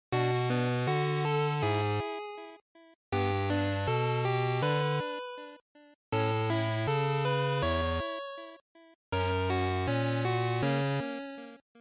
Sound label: GB RPG Event2